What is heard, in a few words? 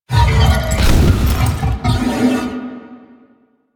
Horse Slam Start; Vehicles; Duviri